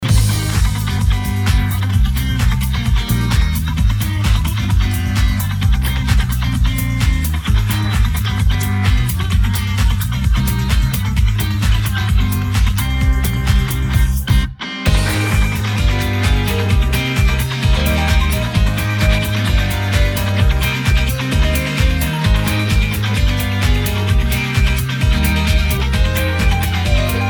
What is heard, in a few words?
no vocal; RX10